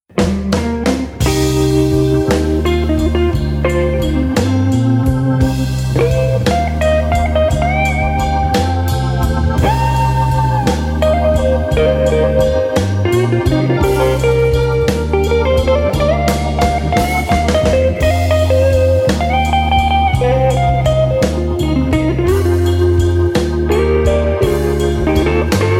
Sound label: Contemporary Slow Blues